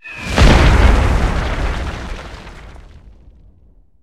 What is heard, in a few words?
artillery enemy